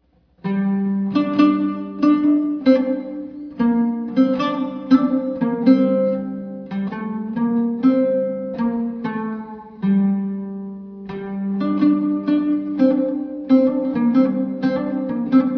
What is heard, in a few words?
sunrhythms